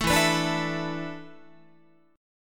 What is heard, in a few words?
E 7th Suspended 4th, Guitar; E7sus4; strummed